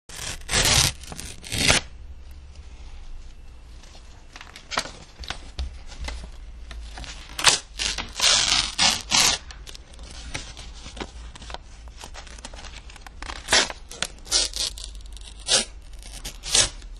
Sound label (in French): feuille dechiree